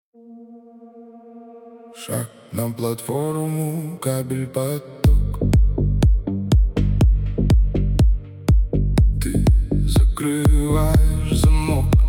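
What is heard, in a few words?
музыка без слов фоновые чиллаут; muzyka v tachku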